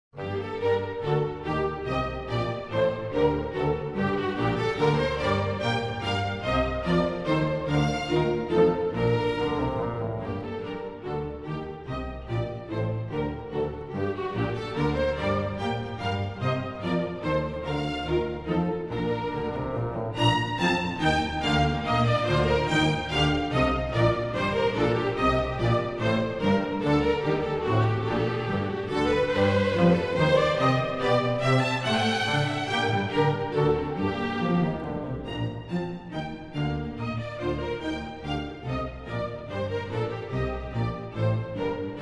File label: Water Music Suite No. 3 in G major - Menuet; HANDEL, Georg Frideric; The Water Music Suites